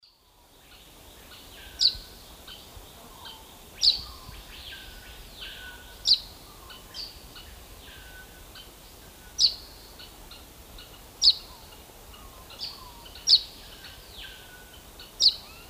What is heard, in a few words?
Myiozetetes similis, calls pair; S. America; Eastern Lowlands